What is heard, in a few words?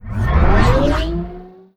sfx science ship moving out; Stellaris; nemesis